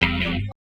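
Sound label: Synth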